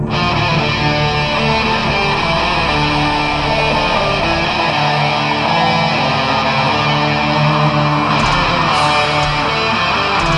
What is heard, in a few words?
Classic Metal